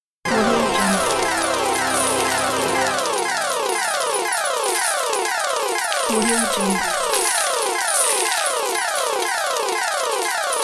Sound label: jackpot slot machine; kuksaudio